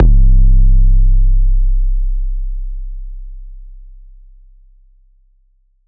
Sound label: 808 Sample C1; drum samples; Bombz